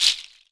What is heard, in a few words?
GOAT TOE, AFRCN SHAKER; Voice Crystal - Steve Reid Definitive Percussion